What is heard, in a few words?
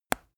teeth clench; koerpergeraeusche; menschen; soundpool